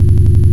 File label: Asteroids Thrust